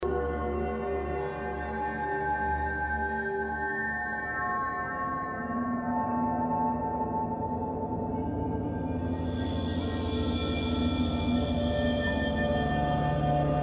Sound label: Shadow Ambience Loop